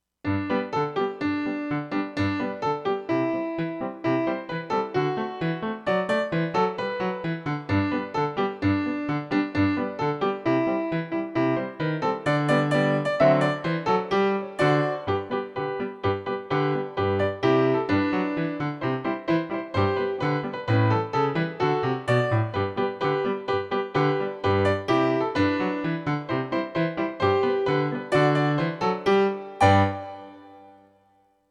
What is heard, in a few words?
Jingle Bells